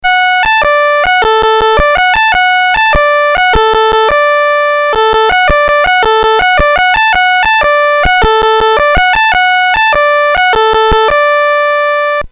BASIC sid CSG8580R5, GAMES; Transmat

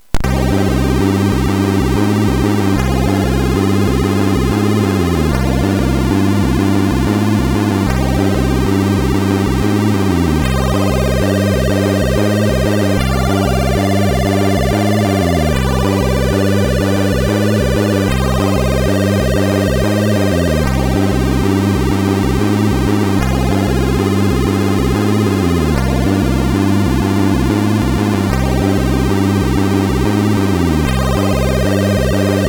Chord, MOS6581; hvsc

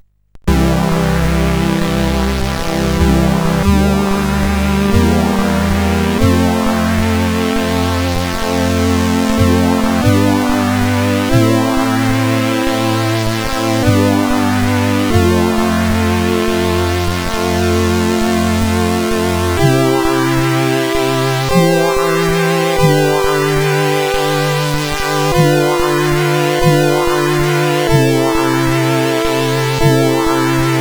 Old Music, CSG8580R5

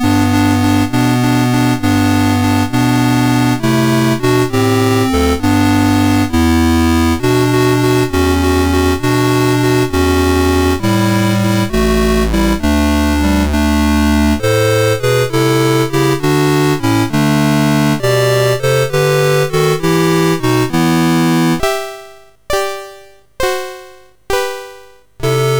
Caroling Caroling, MOS6581R3

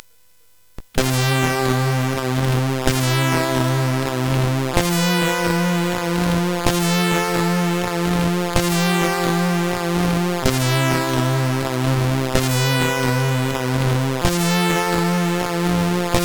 Dreamy, CSG8580; CSG8580R5

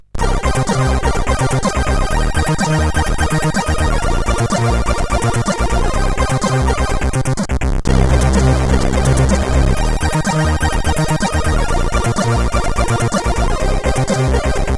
Overdrive sid CSG8580R5

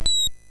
Mini Golf, MOS6581R4

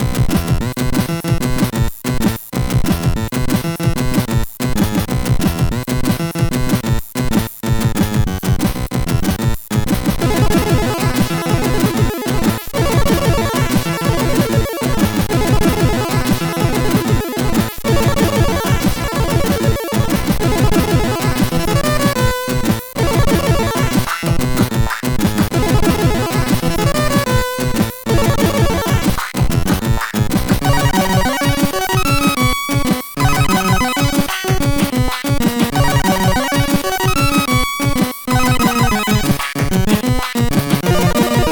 Intro, sid CSG8580R5

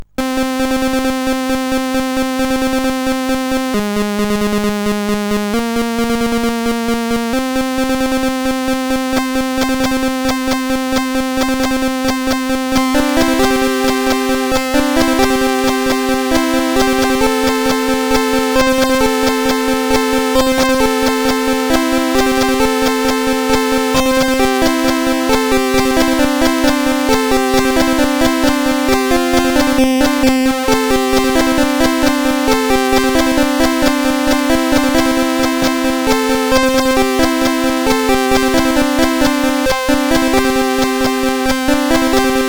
Terrychin, CSG8580R5

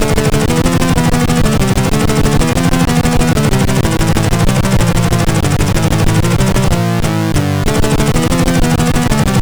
Metal Warrior 2, CSG8580R5

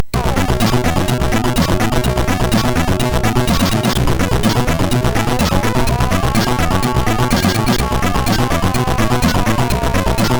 Flesk, MOS6581R3; hvsc

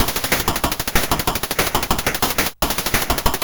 Drums, sid CSG8580R5

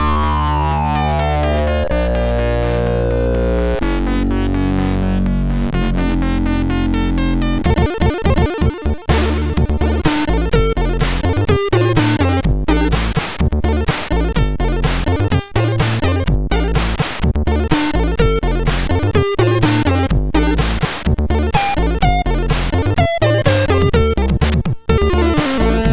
MOS6581R2 sid; Memologie Intro

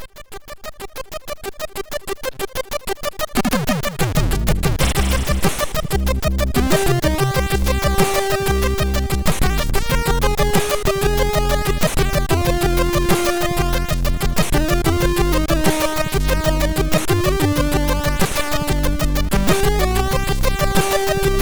sid MOS6581R4; Cream Sormonium

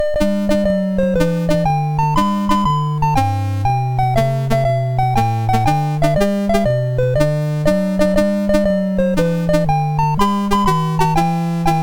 Battle Hymn 2 BASIC, MOS6581R2

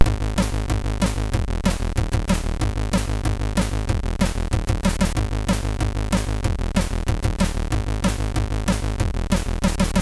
sid, MOS6581R3; Rorschach

